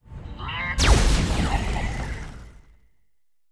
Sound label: beholder atk sp, monster